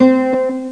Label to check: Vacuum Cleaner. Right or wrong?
Wrong